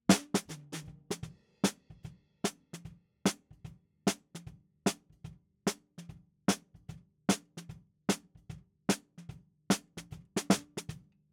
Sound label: SNR BTM